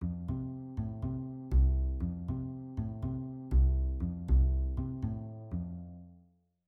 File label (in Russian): bas iz kontrdansa; bas iz kontrdansa xuana benedetti